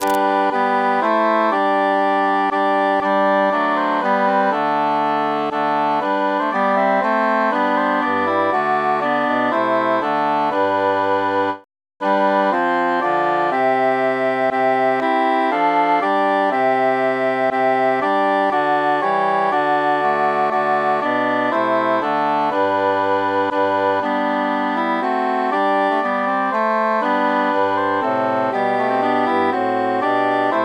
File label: quintus mute; sing we and chant it